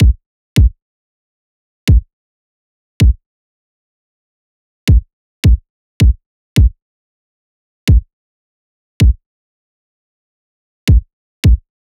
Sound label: kick loop 80; Pop; Drums; Loops; Slate; SD PASADENA Pop Sample Pack